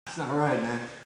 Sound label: hurt light; billy